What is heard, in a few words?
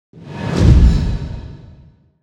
swoosh